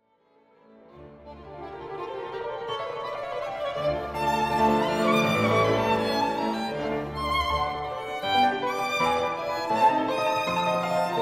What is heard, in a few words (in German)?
Konzert für Violine Hammerflügel und Orchester Allegro molto; Felix Mendelssohn-Bartholdy; MWV O 4